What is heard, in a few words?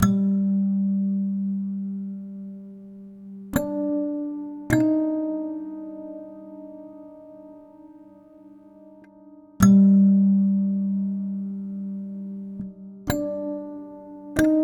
Checkers Piano Theme